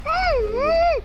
the squeak

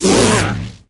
electro dragon roar; clash royale

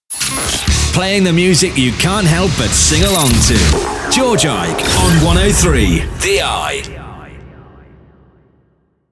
Sing Along FX

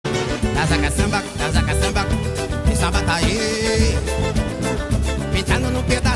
cacamba ringtone

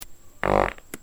funny fart

